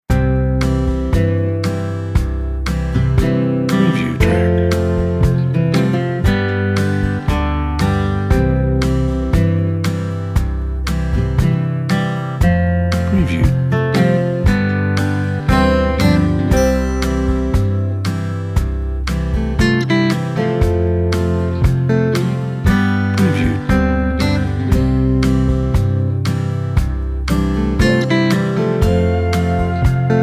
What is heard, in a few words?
Country Breeze IDs